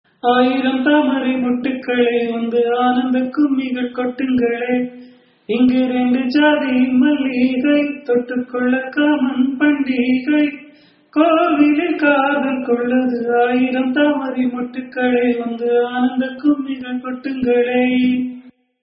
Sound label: raga subha pantuvarali cine; carnatic music